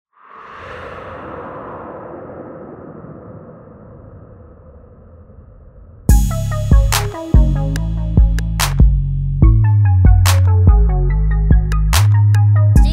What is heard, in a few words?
late night ride